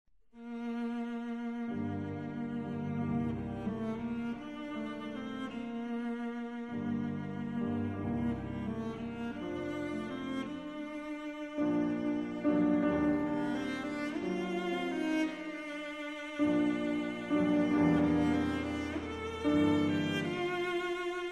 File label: piano trios; russian piano trios; arensky